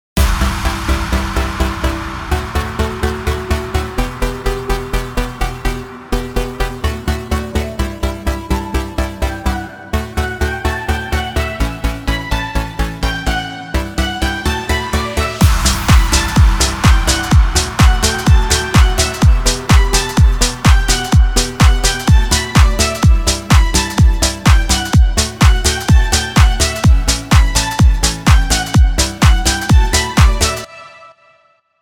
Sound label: Impact FX break full